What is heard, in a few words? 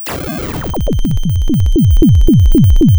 pop